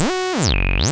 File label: SFX loop, Roland JX-305